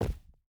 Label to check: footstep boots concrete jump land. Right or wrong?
Right